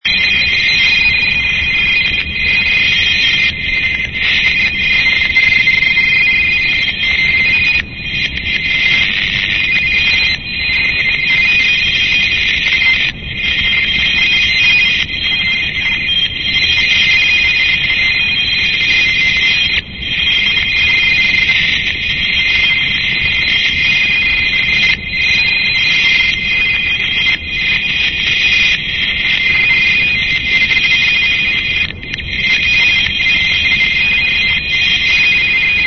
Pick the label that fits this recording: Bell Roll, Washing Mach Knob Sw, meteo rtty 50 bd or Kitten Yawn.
meteo rtty 50 bd